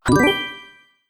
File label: instant win; mixkit